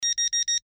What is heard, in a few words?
cell phone ring